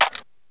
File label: sr25 clip in; weapons; garrysmod